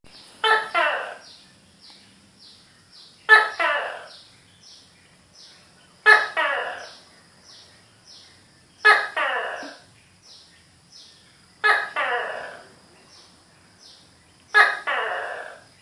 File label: gecko geco